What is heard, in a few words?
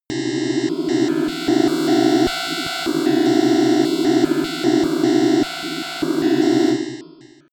atmosphere on off